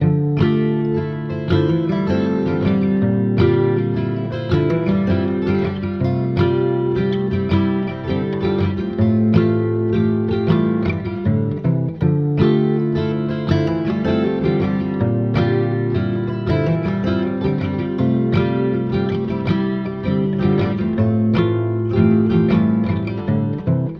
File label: guitar, Sad